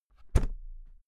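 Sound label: briefcase close, Büroarbeit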